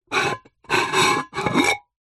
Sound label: household pot lid clay or ceramic lid turn rotate spin on pot